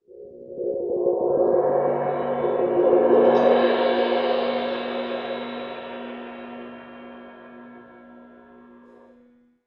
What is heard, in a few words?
Cym trem p, Cymbals, Orchestra; 90 s Sample CDs; PowerFX - Downtown Orchestra